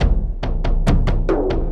Doonk Dooga Doonka Daga, Drums, Frame, Kendang, Short; Sonic Foundry (Sony Creative Software); Friendly Fire